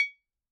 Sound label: Bottle Struck